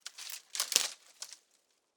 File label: Dry Tree Branches Contact; Sonic Bat